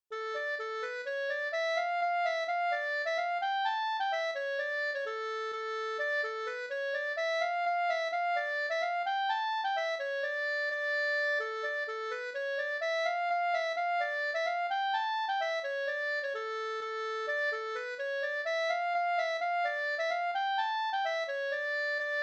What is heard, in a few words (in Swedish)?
polska; "Bockeluras polska", efter Ernst Abrahamsson, Orust, Bohuslän, upptecknad av Ingvar Norman